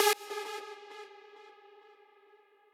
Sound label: lead